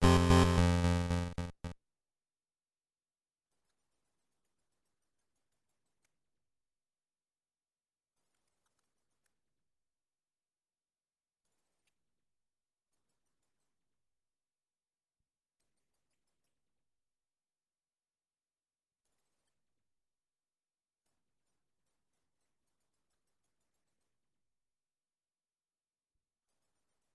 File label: Deep Caverns, Stereo; Metroid II Return of Samus Remastered